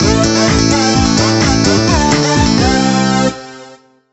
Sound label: Jingle Disco; Deal or No Deal Special Edition; NDS